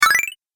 Game App Power Up; video game

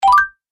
Gameplay Bonus, video game

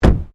Car Ford Focus Door Front Close; Ford Focus hire car; Cars (Sound Effects:Vehicles)

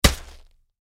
Bag Shot Sand Drop Fall Impact Weight; Drops; Objects; Blastwave; Foley

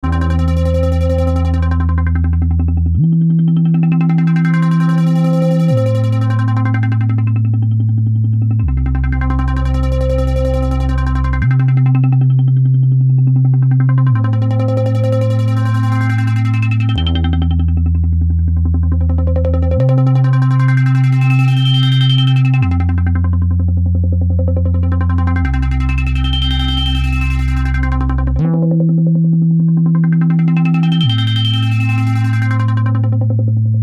Bed Arpeggio Bass Chords; Bed Sound Effects

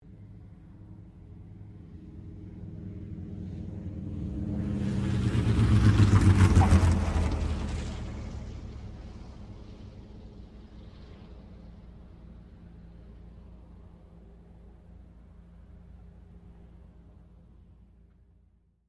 AIRCRAFT AT-6D HARVARD MK III LAND; Temora Harvard MK III; Airplanes; Vehicles; Airplane Sound Effects

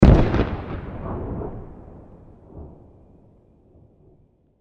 Explosion Blast Black Powder Large; Distant; Artillery Shell; Military; Cannon; Warfare; Blastwave

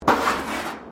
METAL BIN SCRAPE CONCRETE

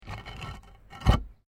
PAVING STONE MOVE, dragging; Material components for constructing more complex sounds; Objects

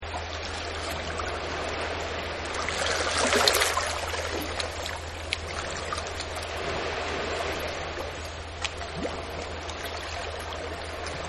Boats Docked; Vehicles; Partners In Rhyme

